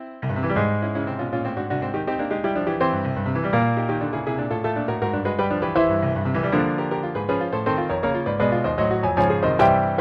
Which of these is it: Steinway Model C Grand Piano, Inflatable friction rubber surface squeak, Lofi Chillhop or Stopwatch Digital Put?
Steinway Model C Grand Piano